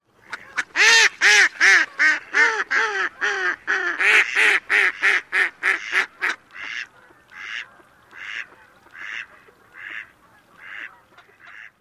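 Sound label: female decrescendo quacks and male rhaeb calls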